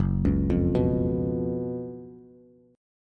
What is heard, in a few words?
Gb Major 7th Suspended 4th Gb Bass; GbM7sus4; arpeggiated; JGuitar